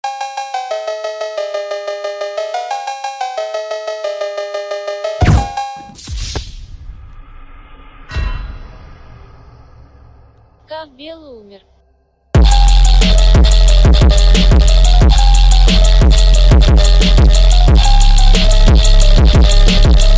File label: COWBELL